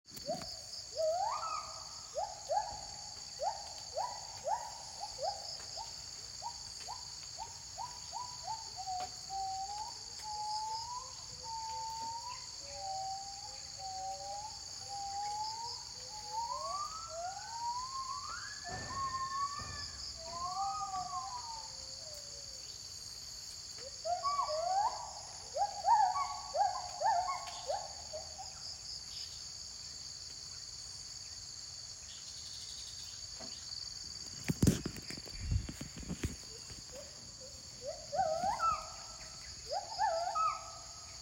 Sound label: H. agilis duet